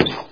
step

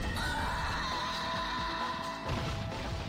death scream; morrigan

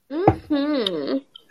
mmmhhhhmmmm

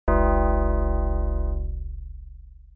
coin

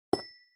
block amethyst block place

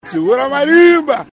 marimba

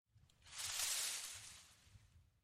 moving bushes

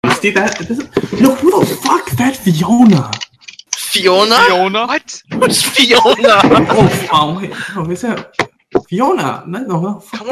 hahaha

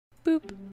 block boop

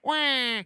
wahh

